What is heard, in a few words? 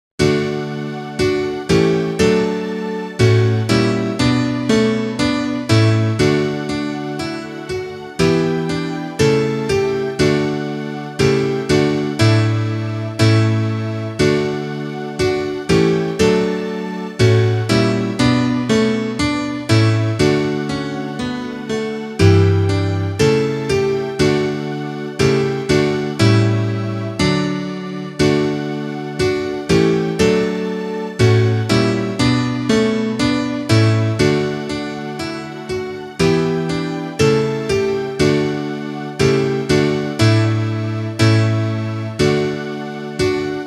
instrumental; buscai primeiro